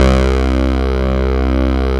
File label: Juno Clavi